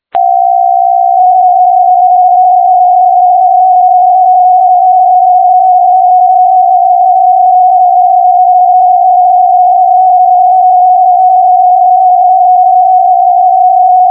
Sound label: QPSK63 USB test message